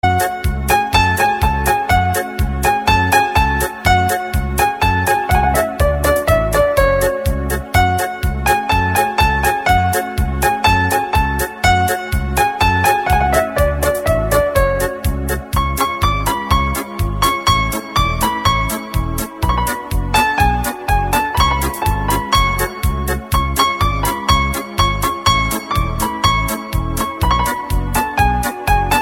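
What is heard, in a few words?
Romantic instrumental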